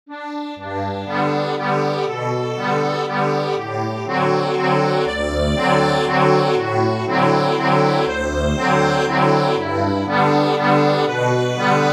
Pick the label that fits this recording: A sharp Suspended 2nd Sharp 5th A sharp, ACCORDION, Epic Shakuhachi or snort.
ACCORDION